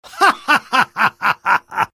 spy laugh happy

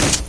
30cal, weapons; source engine; dod

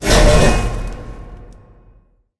piston start

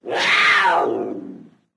jungle exit windows; windows 9x; sound schemes

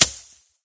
hit grass, weapons; source engine; dod

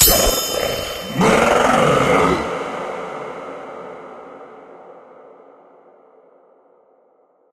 medal click rare; yeti; source engine; tf

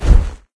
lift weight impact; ambient; source engine; ep2; outland

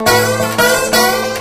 dobro danger; music; Left 4 Dead 2; Source Engine; zombat

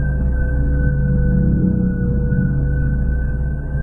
citadel sick drone loop; ambient; source engine; episodic

